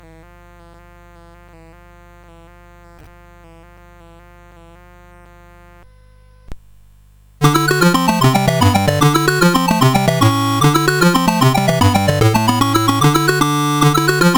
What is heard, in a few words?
Commodore Jig CSG8580R5; Digger